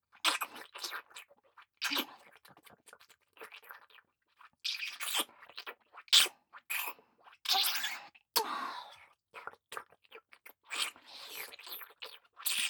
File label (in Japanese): 乳首舐め; レイナ（姉）